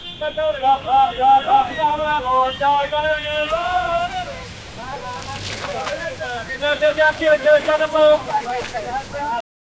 chennai kasimedu fish mar, coastal

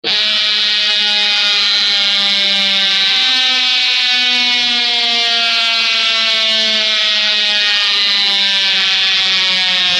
robotic roar animatronic, high-volume

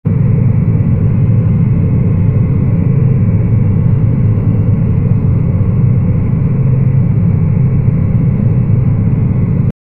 the interior of a rickety, spaceship

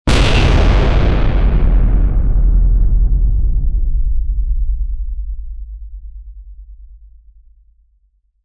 a metal ship hitting an, rumbly; SFX Engine

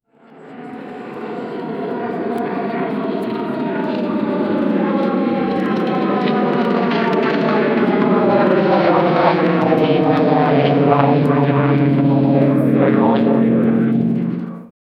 a plane flying over a, atmosphere

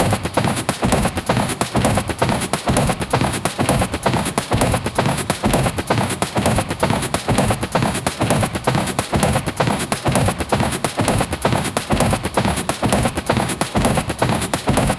sound of a warrior in, character movement

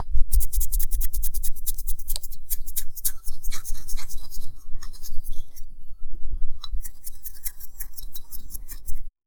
comb brushing through tan, hair

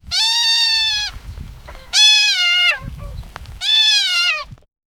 monkey screeches vines, jungle

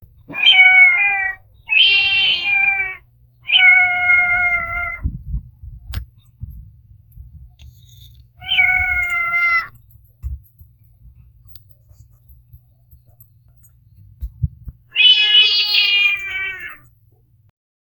talking bird and, domestic animals

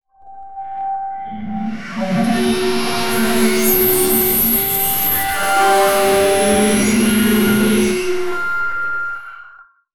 a continuous single take, factory

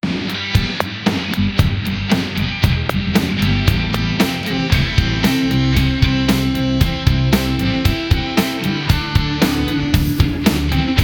rock music, country

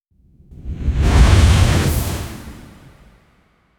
a cinematic film burn tra; smoky

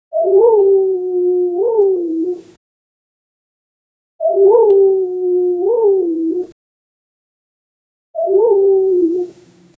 owl voice; animal

